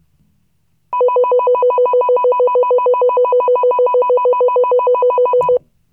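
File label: warble, siren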